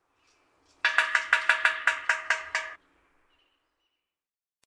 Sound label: Yellow-bellied Sapsucker; The sapsuckers